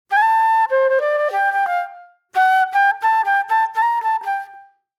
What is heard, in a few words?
Reflective Flute